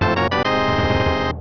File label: chest item; Open Zelda; Quest Designer 2.0.4b